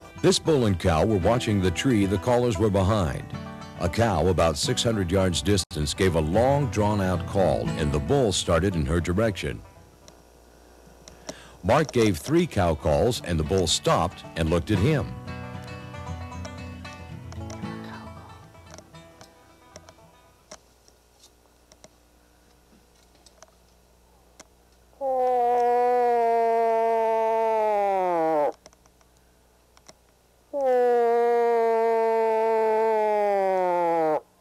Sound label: wild moose cow exchange; moose rut